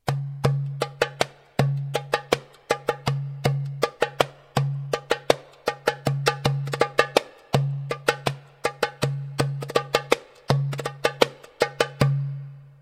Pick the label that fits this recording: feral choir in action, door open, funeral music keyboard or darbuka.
darbuka